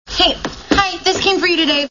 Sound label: mail; Big Bang Theory